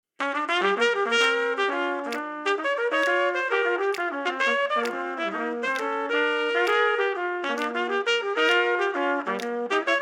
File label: Blues